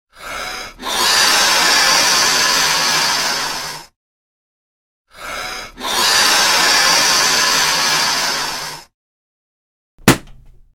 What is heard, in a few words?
sound balloon